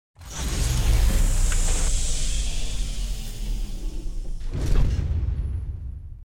large door close

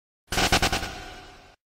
run away sound effect; pokemon red blue yellow

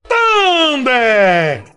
thunder

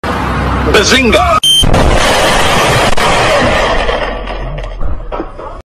car crash; bazinga

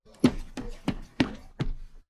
running footsteps

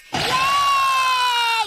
yay

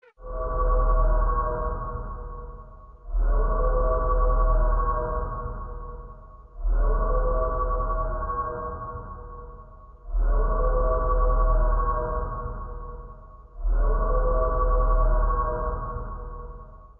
alarm; dreadnought

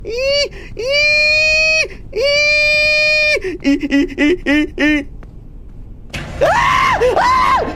scream

